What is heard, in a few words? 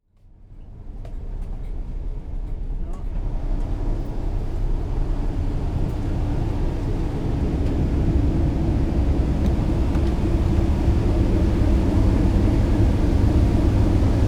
engine compartment